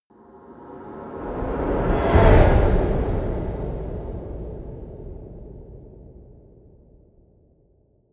haunted shout, risers; whooshes rises

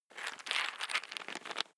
nut handling; haus garten; kueche

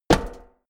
step walk metal hollow; schritte

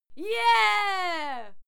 female cheer yeah throaty; schreie; menschen

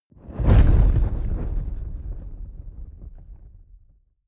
fire whoosh dull; swooshes-swishes

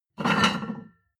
plate on plate take slide hard

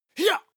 male attack shout short

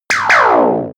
alien mini gun; laser

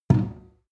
sink pull plug no water

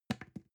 plastic can small fall bounce soft; objekte; dose

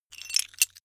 metal pieces clatter short

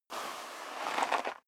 step high heels gravel light slide long; schritte; Footsteps; menschen

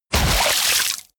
body fall water harsh splash; krieg-waffen; nahkampf; soundpool

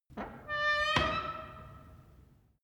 wood door old creak high soft

